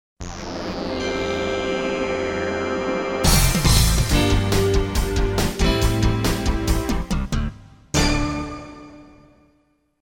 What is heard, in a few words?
News Exit Bed